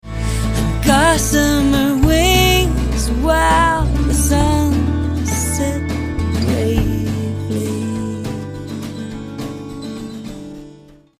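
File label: Female Voices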